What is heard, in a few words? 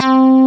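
synth bass C4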